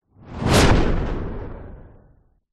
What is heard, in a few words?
sound design whoosh fire like airy